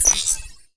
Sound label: Computation Light; Digital Rain Lab; Signal Frontiers